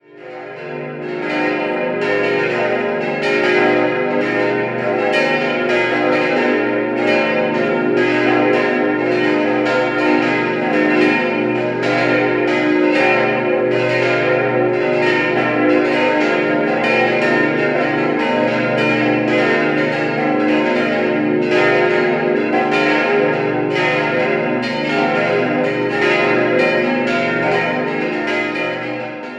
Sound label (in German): Penzberg, Glocken